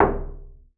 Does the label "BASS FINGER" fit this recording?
Yes